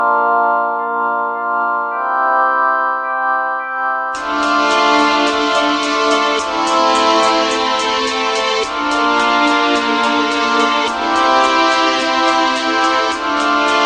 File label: Melody Tune